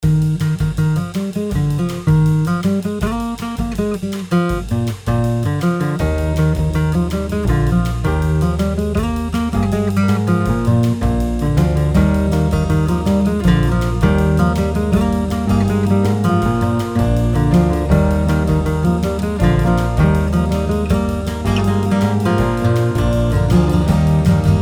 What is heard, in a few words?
acoustic loop, Country